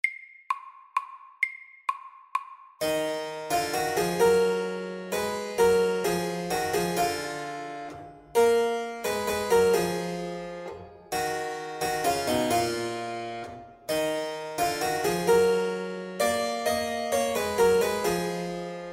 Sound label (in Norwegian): Metal minuet FORT